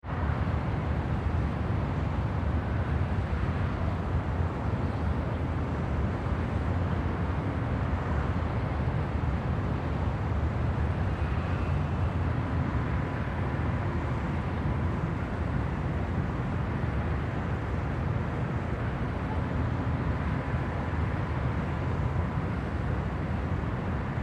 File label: city rooftop, ambience